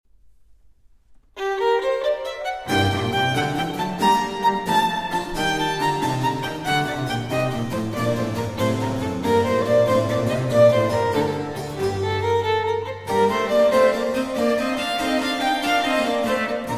Sonata For Violin & Continuo in G Minor, Presto e spiccat